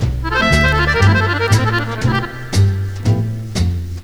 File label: Jazz